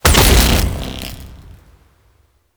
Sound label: Beam Fire Stop; Gameplay; Mission One; Condrix; Warframe; The New War